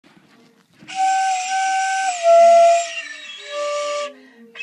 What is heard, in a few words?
Flute